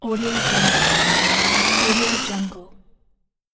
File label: rattling engine hover